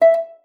STOP D#5, harp; Xsample VOL-8; HARP+FX

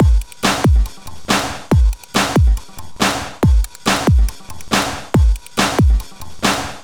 Beat, Drums, Eclectic Electronica

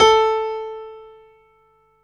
PIANO A3; Best Service Alpha Dance vol.2

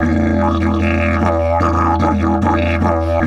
150, DIDGE RIFFS; Big Fish Audio - Didgeridoo and Other Primitive Instruments

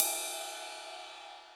Ride, dry kit

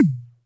Toms, Elektron MachineDrum SPS1 MKII

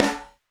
Gunshot; Airwindows; BeyondSnares

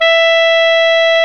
Clarinets

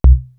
MOD PIPE; East West - Global Sounds; PROC.BASS

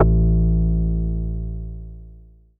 OSCTKBS A1, Vintage Keyboards; Big Fish Audio - First Call Vintage Keyboards; OSCAR

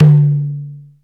mandal ohin, Ethnic Percussion

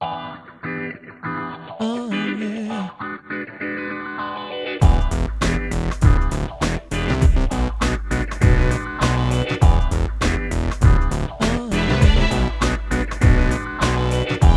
100BPM, Hip Hop; InVision Interactive; 40 Oz Phat Slammin

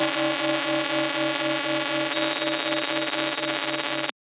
Pad; neuro; Stanza

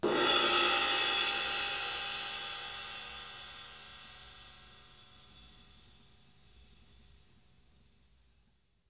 SIZZLE, RIDES; Sampleheads; New York City Drumworks VOL-1; SP RIDES

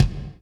HR16 BD; Crypton - DrumBase5000

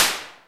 SLAP, Simmons SD-8 Drums

